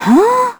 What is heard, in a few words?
confused; Crypt of the NecroDancer